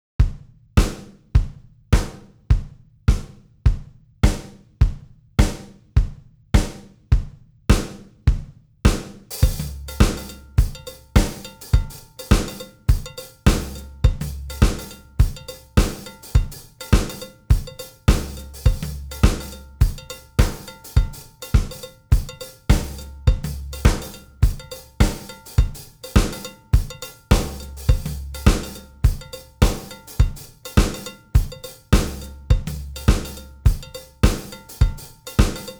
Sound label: Drums